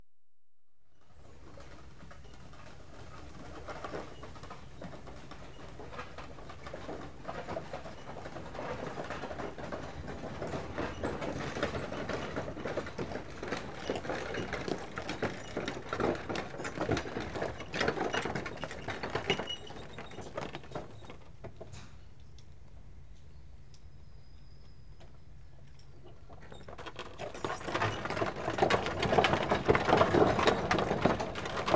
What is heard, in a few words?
One Horse And Cart At Walk Approa, Horses; Horses & Dogs; BBC Sound Effects Library